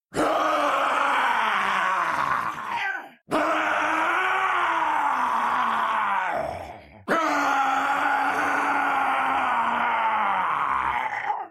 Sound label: reaper screeem